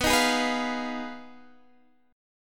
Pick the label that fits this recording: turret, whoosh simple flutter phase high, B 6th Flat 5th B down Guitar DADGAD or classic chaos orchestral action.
B 6th Flat 5th B down Guitar DADGAD